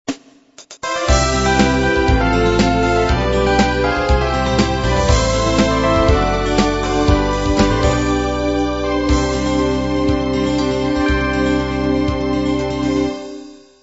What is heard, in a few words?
soft beat intro